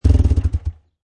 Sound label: Car Motor Shut Off Engine Perspective